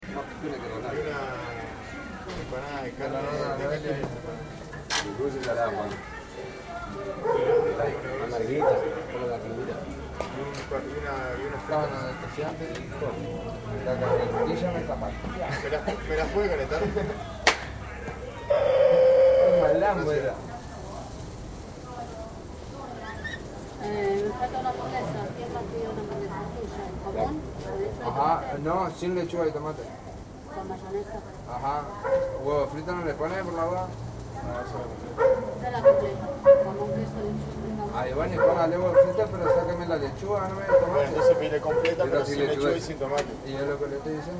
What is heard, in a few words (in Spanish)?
bares carrito; Rosario